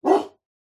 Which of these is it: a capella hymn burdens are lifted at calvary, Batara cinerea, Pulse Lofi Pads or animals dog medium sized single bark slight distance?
animals dog medium sized single bark slight distance